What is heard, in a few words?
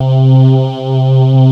CHORALIS, DANCE; Pro-Rec